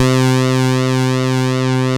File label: TEISCO, BASSES